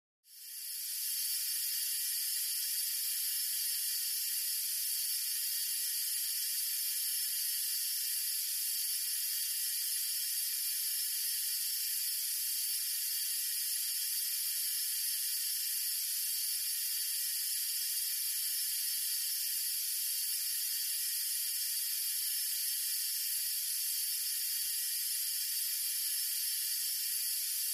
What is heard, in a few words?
Gas Leak D Stdy Fuel L